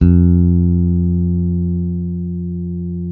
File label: bass, sustain; Sampleheads; Will Lee Bass Library